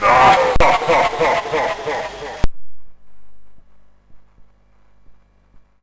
YM Vox Laugh; ChipShop; CHIPSHOP DELUXE; CHIPSHOP HITS

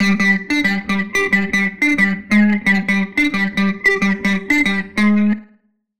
Guitar line, 90bpm, hiphop; musicradar

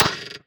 Snare, techno drum

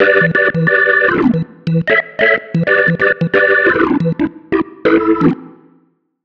Keys line 90 E, 90bpm, hiphop; musicradar

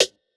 Tech Drum Hit, techno 120bpm

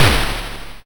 Snare, YM, CHIPSHOP HITS DRUMS; ChipShop Deluxe

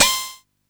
Perc, techno drum

